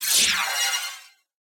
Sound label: cyberdeck outro; sonniss gdc